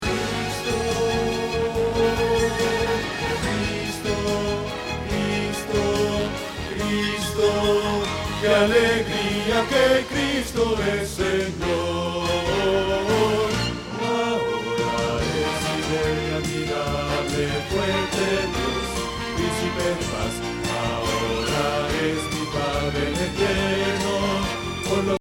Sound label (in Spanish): Bajo